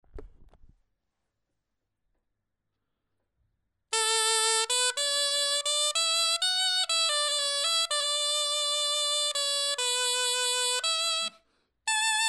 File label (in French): clarin etude; clari de bigorre